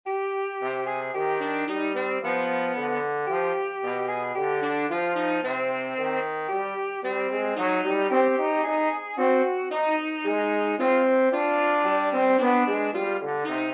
Waltz, altsax en trb; Farewell Waltz Op 69 No 10; Chopin

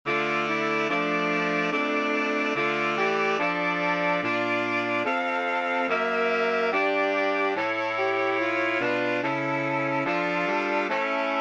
Sax Quartet; O Heer hoe groot en hoe vervaarlijk; saxofoonkwartet

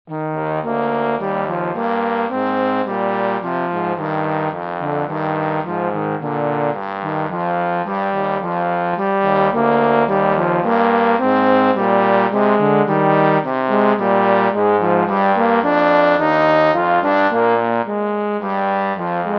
Brass for 2 3

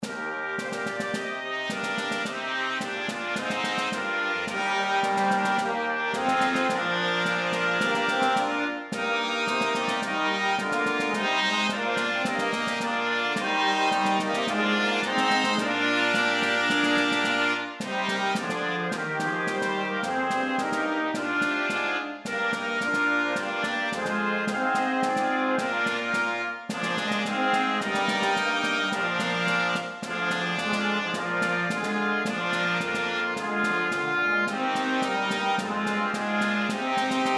Fanfare; Fanfare - Let's get started

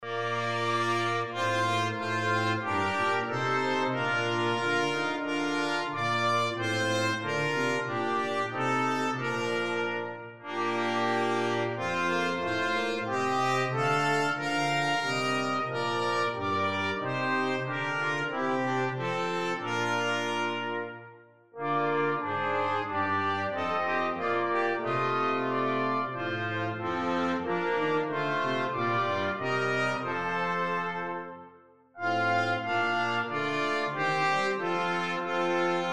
Brass Quartet; Valet will ich dir geben

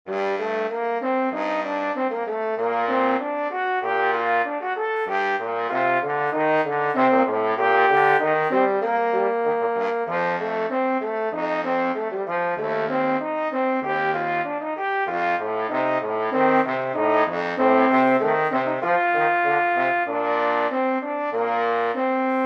Brass for 2 3

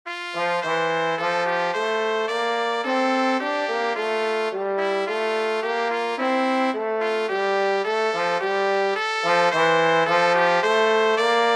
Brass for 2 3 trumpet and trombone

